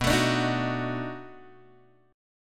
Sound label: B 7th Sharp 9th, down, Guitar, Open G